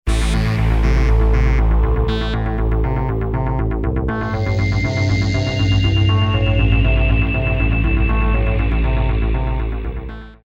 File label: Techno Flying